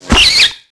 Rat death; characters

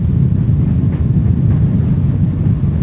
train engine; Soultree

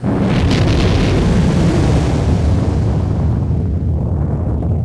Ship start; vehicles

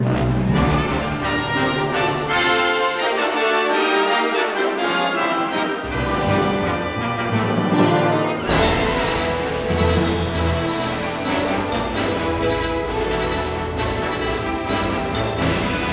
music flourish

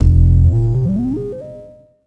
checkpoint

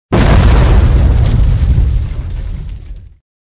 explosion short debris; weapons; Soldier Of Fortune II Double Helix; XBOX

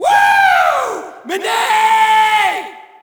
Shout, audience; UFC Tapout 2

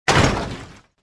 metal bounce

